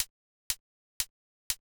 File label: Hat Closed Dirty; hydrogen